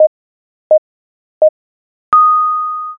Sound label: jiho